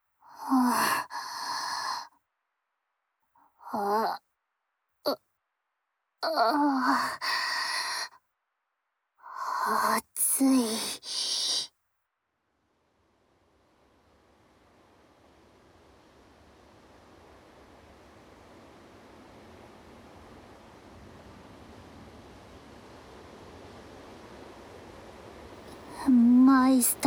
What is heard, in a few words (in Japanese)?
湧き水の音。飲む、浴びる、タオルを浸して絞る; アルジェ; 猫吠埼湧水